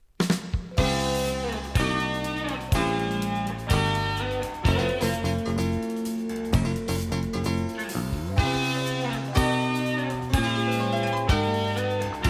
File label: drums in the night